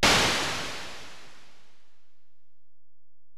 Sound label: EPerc, Alesis DM-PRO